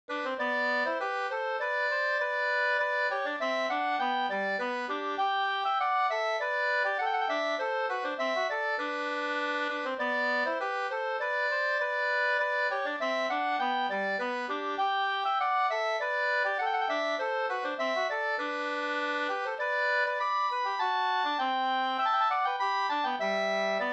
skanklat

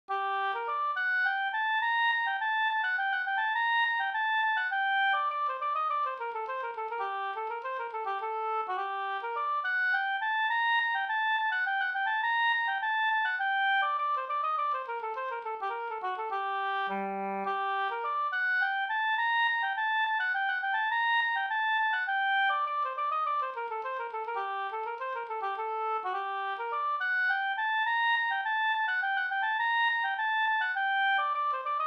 slangpolska; Stigtomta Sd

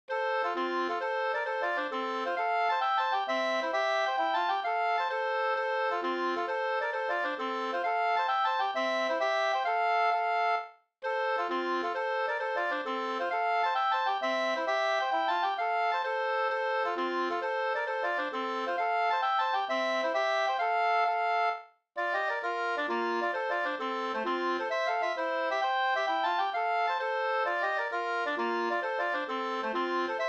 hambopolska, 2st

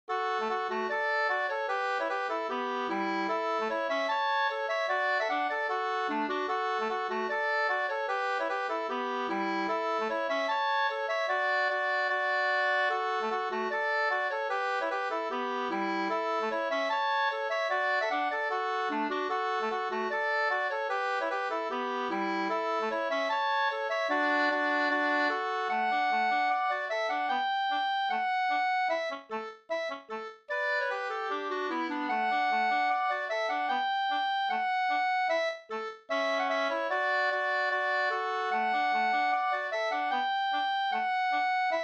schottis 4takt; Jernbergs schottis av Gustaf Jernberg Gs 2st

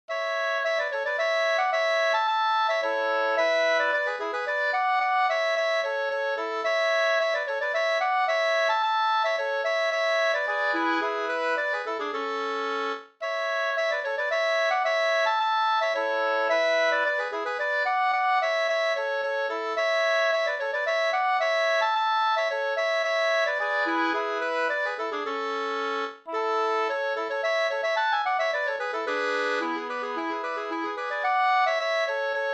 polska Brudpolska

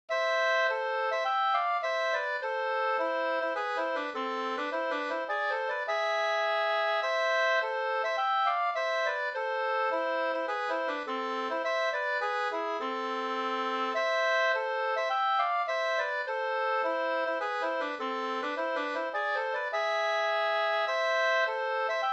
polska Bodapolska

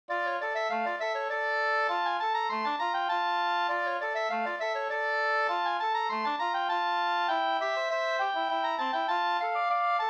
slängpolska

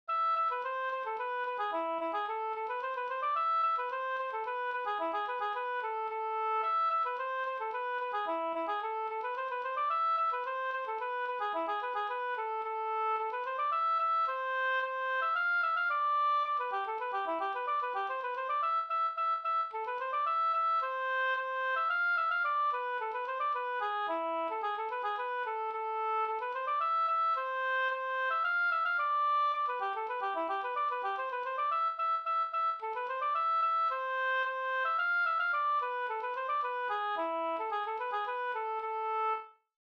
slängpolska

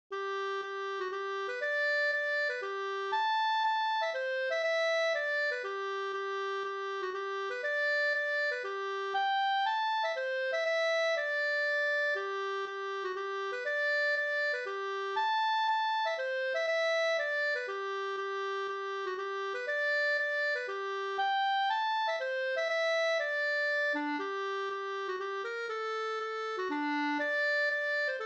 polska fr Garpenberg; ur notbok e PW Bergstrom Hedemora

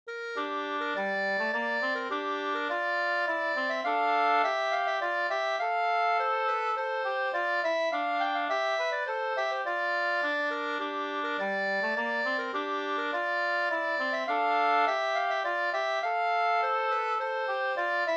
polska senpolska

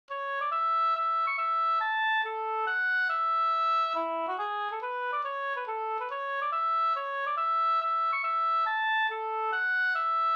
reinlender

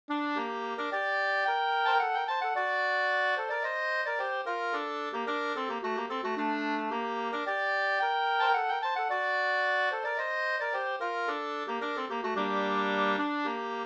polska Senpolska

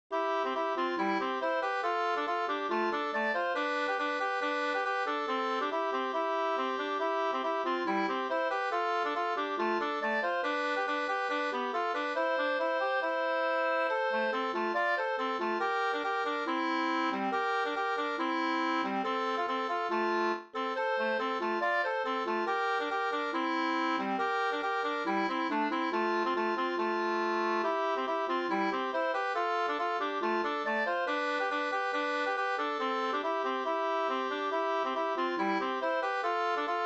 polka C F dur

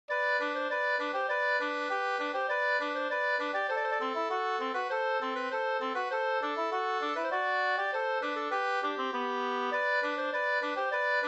snoa

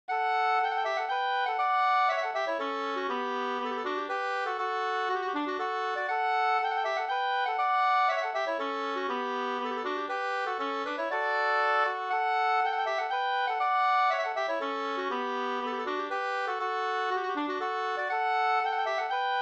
polska

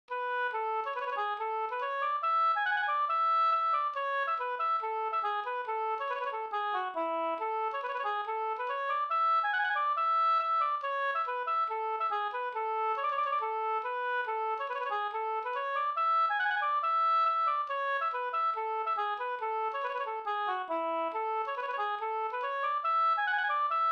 reinlender

